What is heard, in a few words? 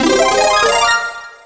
Sound effect of Power-Up (Alternative); SM3DW